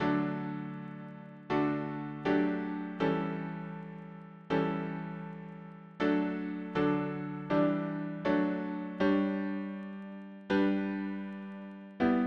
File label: harmony piano